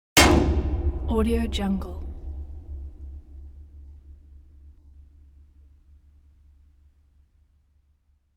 reverberant impact on metal tank; sound ideas

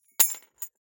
object key key ring small drop parquet

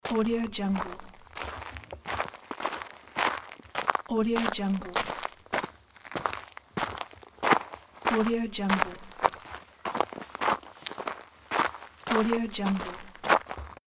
walking on gravel

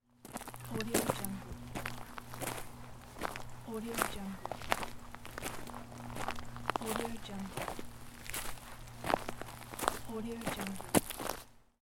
walking

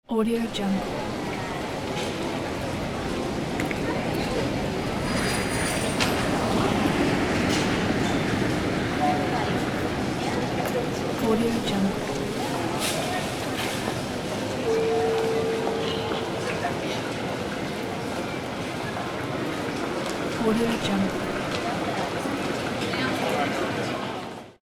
airport

